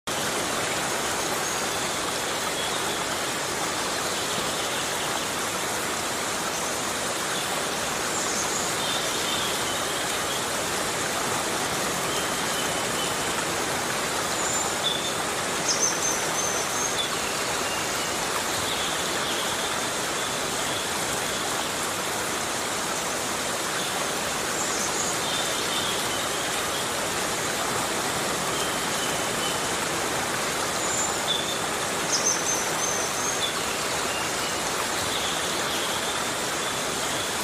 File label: Rain